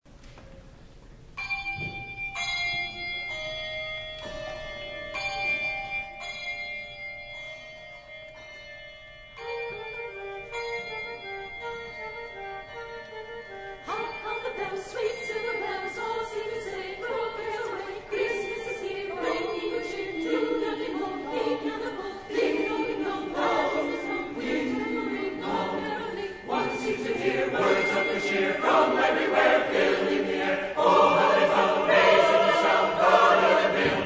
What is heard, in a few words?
Ukranian bell carol; Willhousky